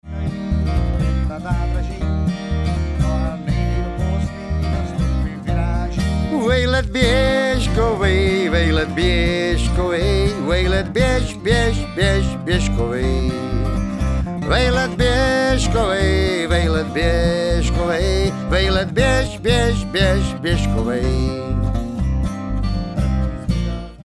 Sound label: vocLead, bluegrass